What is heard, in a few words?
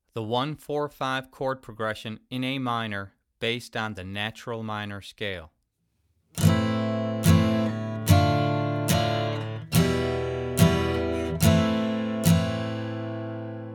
1 4 5 In A Minor Key